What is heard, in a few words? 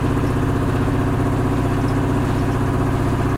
Lever Power